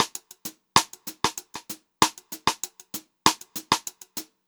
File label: Funk Groove Drumloops, funky; USB Soundscan vol.38; AKAI; 90 s Sample CDs